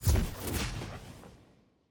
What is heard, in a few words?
member banner intro; parties